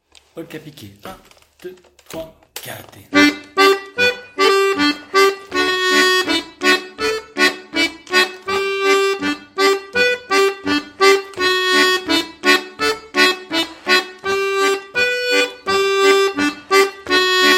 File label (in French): Polka piquee